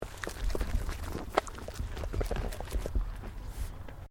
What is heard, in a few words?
Animal Alpaca Female Eating; Alpacas on a farm in Gippsland Victoria; Miscellaneous Animal